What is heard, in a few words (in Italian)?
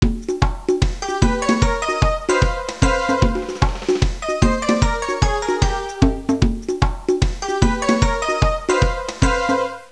suonerie musica jazz